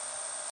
television Loop; eggomatic

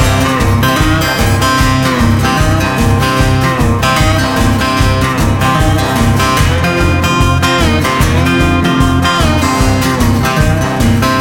mega Win Music, game; dead or alive 2

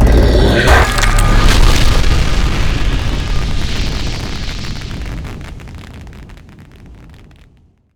Sword Thump; dark king

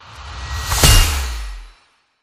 chest Wild Won; lost relics

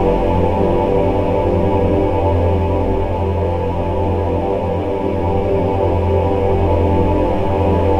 near Win tone; gonzosgold

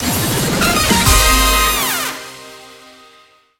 free spin outro; vegas night life

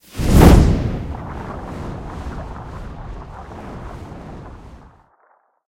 respin Win; shangrila

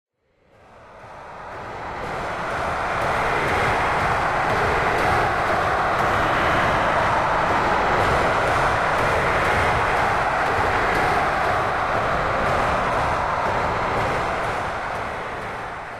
crowd Chant Italy, football

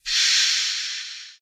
symbol Thaw; shangrila

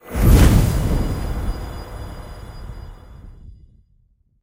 near Win Reel; parthenon

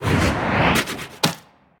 plus One; dead or alive 2

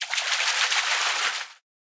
swimming under the reels; koi princess

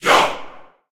gorilla Mask Land; gorillakingdom

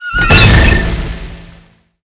safe Choose; wild wild west

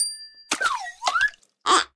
duck Fetch Anim; scruffy duck

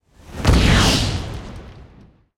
wild Explosion; willyshotchillies

